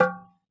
oxaca, percussion; ILIO; Synclavier Percussion 2; World & Orchestral

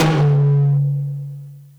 TOM J1 LOU L; Zero-G; Chemical Beats

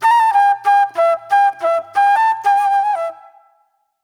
Mellow Flute; Sonic Foundry (Sony Creative Software); Manhattan Flutes; Mixed Berry Jam

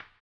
HAMBONE, Vocal Blues Soul; Spectrasonics; Planet

